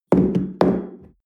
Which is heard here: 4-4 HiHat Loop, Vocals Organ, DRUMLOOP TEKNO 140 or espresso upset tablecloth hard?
espresso upset tablecloth hard